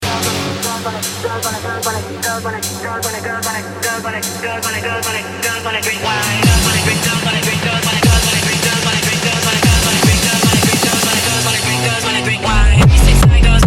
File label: Techno